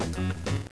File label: Disco - Restore Down; discotheme